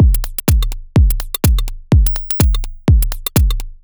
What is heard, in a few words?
125bpm, House Drumloops; 90 s Sample CDs; Zero-G House Fabrik Drumloops; ZG Drumloops